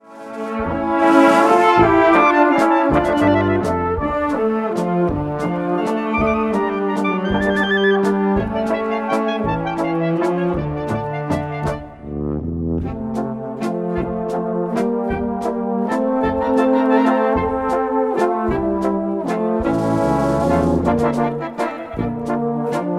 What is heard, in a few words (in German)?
Walzer, Blaskapelle